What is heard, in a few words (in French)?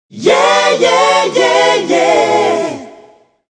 Choeur; MOBICLIC 83; CPLUSCLAIR